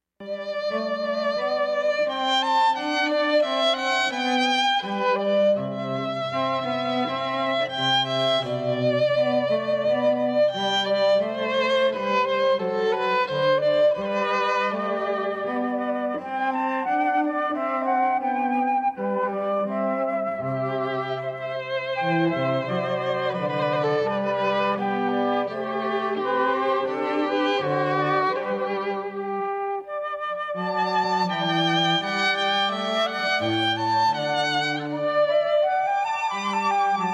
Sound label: Flute Trio; Quantz Andante; Cantilena South Florida